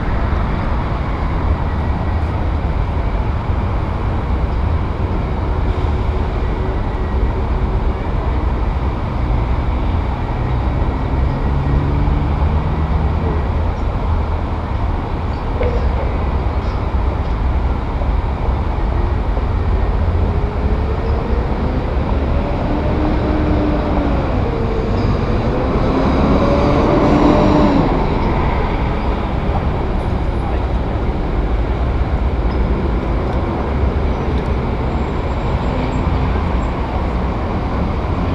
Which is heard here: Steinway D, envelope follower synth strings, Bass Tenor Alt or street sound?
street sound